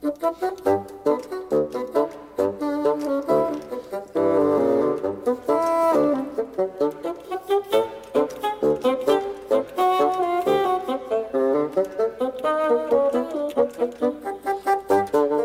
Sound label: Chamber Music